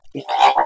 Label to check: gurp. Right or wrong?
Right